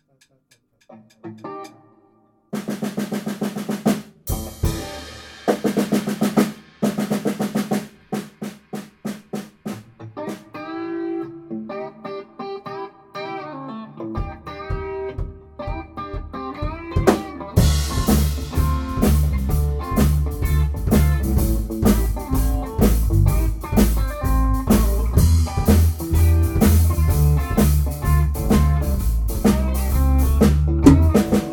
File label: Blues